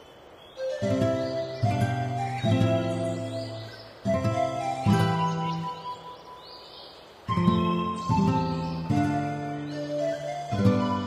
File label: music